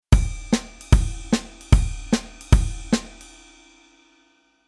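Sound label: Rhythm Velocity 42 Snare Drum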